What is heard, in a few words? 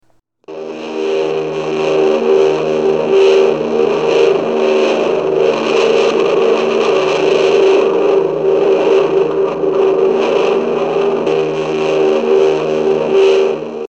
merlin engine; ramdemos